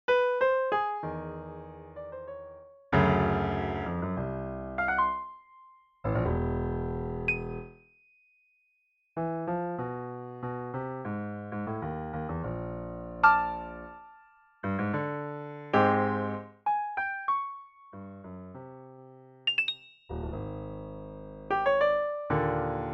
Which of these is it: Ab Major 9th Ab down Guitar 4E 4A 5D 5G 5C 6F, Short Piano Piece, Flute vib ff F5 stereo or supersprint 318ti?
Short Piano Piece